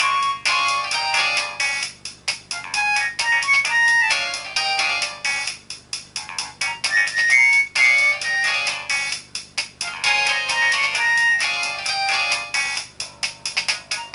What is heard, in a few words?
nokia 1200 blue ice ringtone